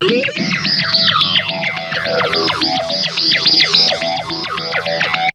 SIREN, Funk Guitar; 90 s Sample CDs; Zero G

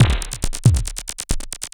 percussion loops, 138, Techno

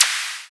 Clap

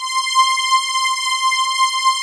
PADS; Pro-Rec - Synthsonic; Z1 PADS

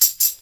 TAMB, Percussion Slam; InVision Interactive

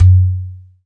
CNGA, ETHNIC PER; AKAI S-Series CD-ROM Sound Library VOL-5

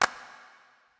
Clap, Drum Percussion Single Hits

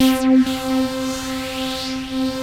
FLYING ATCK, synths; Pro-Rec - Synthsonic

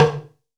talking drum; AKAI S-Series CD-ROM Sound Library VOL-5

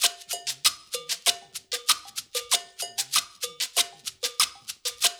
percussion loop

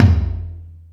Toms, E-MU Pro-Cussion

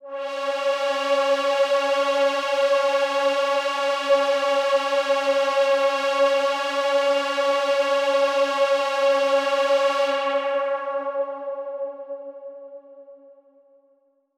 Big Pad C#3; KG MegaSaur

